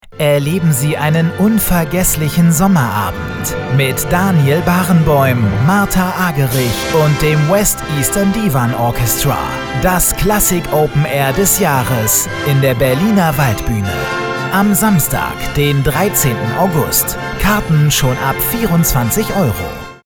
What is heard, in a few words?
Classic Open Air